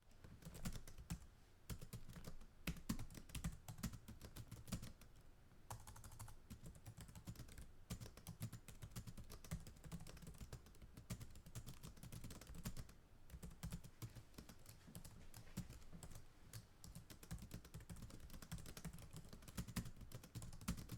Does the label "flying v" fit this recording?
No